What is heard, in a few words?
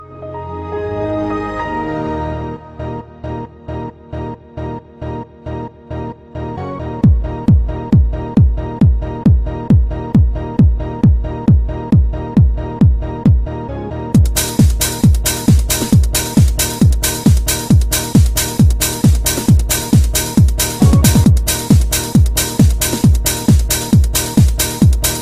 Windows Xp Mix